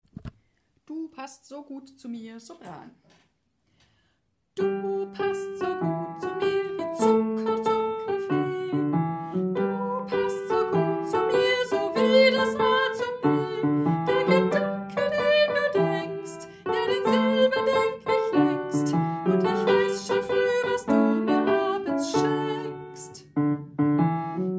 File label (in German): Sopran, Chor; chor ag